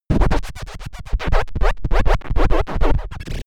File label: unknown species signal